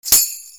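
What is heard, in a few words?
percussion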